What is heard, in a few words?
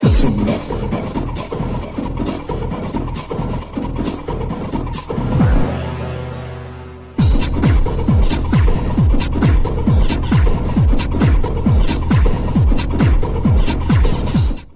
detonate